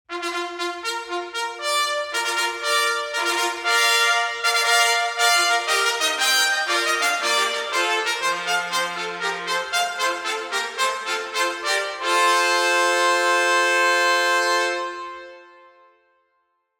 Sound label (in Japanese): トレーニングレース ファンファーレ; umamusume pretty derby winning live 12 2023